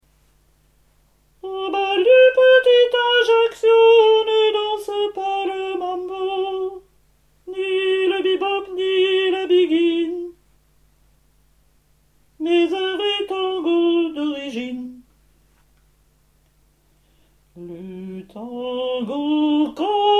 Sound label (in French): le tango corse soprani